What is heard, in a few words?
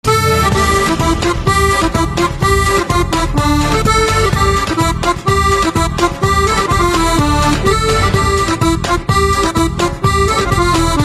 Accordion Instrumental Ringtone